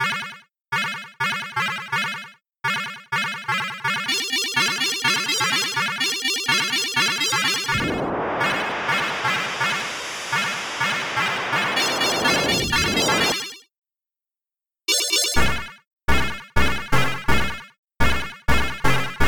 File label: tension, chiptune